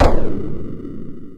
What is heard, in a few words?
LASER GN; BEAT2000